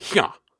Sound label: Grunts and Chants, Viking Vocal